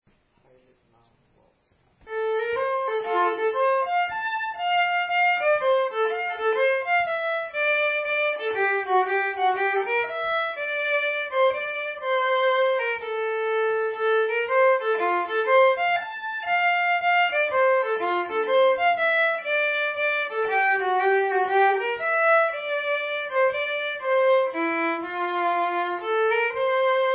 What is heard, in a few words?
Waltz F; Valses